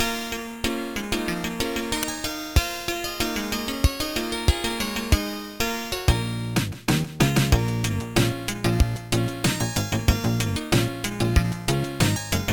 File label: chiptune